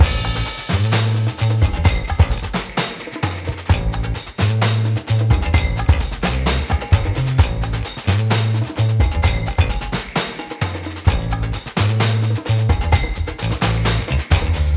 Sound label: Action Timed Robbery Alt LOOP, Wild West Music